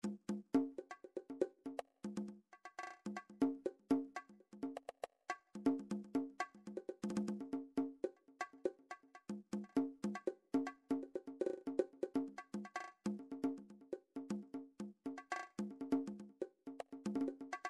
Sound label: midi drums percussion; Midi Vol 4 Drums & Percussion